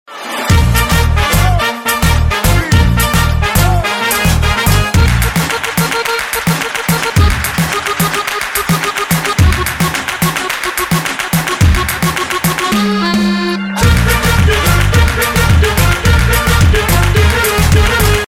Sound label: Instrumental Tone; Yjhd